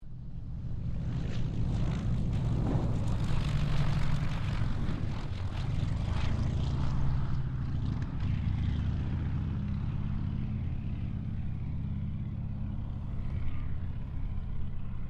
AIRCRAFT C195 SQUADRON 2 TOUCH N GO; Mixed aircraft squadrons; Airplane Sound Effects; Airplanes (Sound Effects:Vehicles)

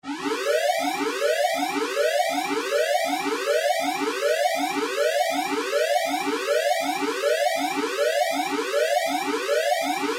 Sci Fi Alarm Battle Stations; Control Panel; Blastwave

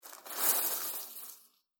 Warehouse Searching Through Garbage

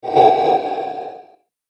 Zombie Death Breath; horror; zomby

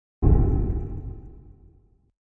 Flame; Sound Design

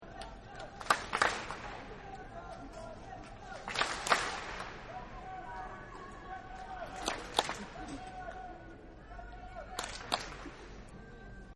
Lets Go Chant, Crowds; Backgrounds

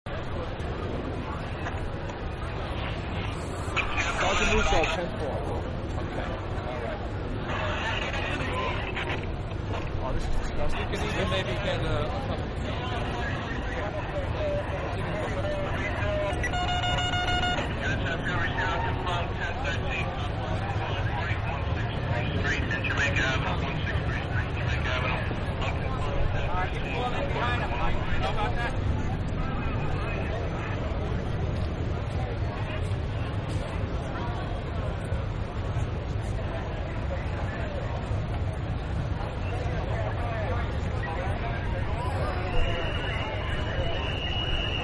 Riot Breaking Out With Police; Crowds; Manhattan Production Music

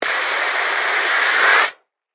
Device Blow Torch, Flame, Propane; Tech

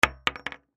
Bullet Shell; Bullet Shell 44 Drop Wood; Drops (Sound Effects:Objects); Blastwave; Warfare

